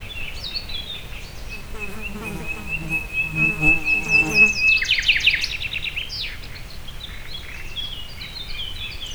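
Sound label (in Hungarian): madár szél, bagoly; termeszetben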